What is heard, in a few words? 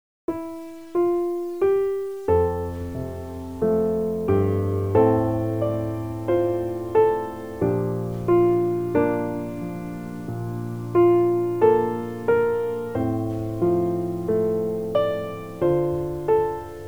londonderry air embellished